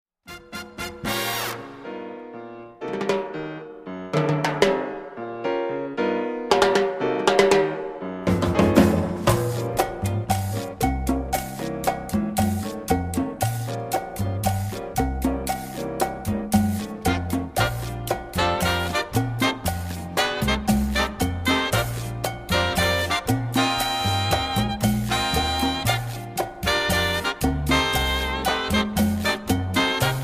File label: Cha Cha Cha; Auld Lang Cha Cha Cha